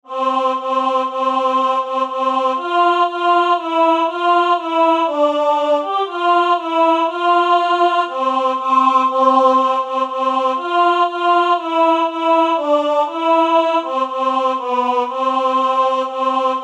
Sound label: Hark The Herald Angels Sing Alt, Midi; germersheim; Weihnachten